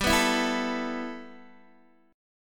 G 6th Add 9th G, Guitar DADGAD

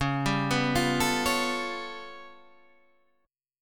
G 9th Flat 5th C sharp

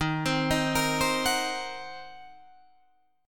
D sharp Minor 6th D sharp down Guitar Drop D

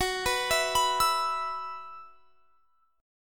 Gb 7th Suspended 4th Gb down, chord; Gb7sus4 arpeggiated

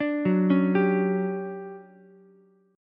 D Major 11th Ukulele C6; arpeggiated

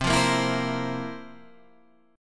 F Major 7th Suspended 4th

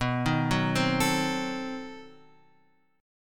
B 7th Flat 9th B, guitar chord; B7b9 Chord {7 6 4 5 X 5} arpeggiated

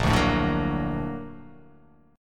Bb Augmented Major 7th Bb; Bb+M7 strummed; JGuitar

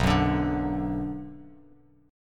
B Minor B Bass Standard; strummed; JGuitar

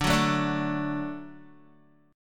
D Major D down Guitar; strummed; tuning 4E,4A,5D,5G,5C,6F

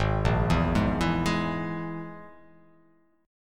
G sharp Suspended 2nd Sharp 5th, chord; 4,1,2,1,4,3,x,x

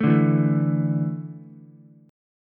D Suspended 2nd D down Ukulele; strummed

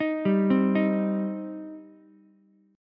G Suspended 4th Sharp 5th, Ukulele; arpeggiated; JGuitar

